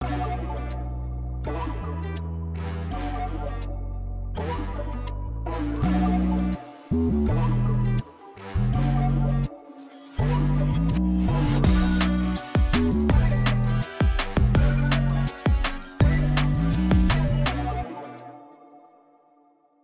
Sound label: drama, AI music